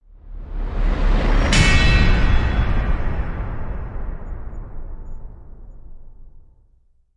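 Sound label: Hit Swoosh Reverse Chime; Brand X Music; Brand X Music Catalogue - Editor's Essentials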